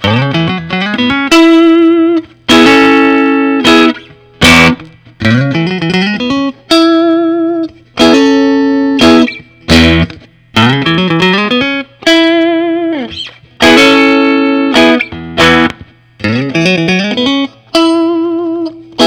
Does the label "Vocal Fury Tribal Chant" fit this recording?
No